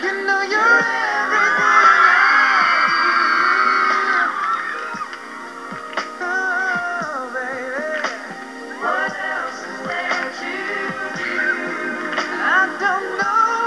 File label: cry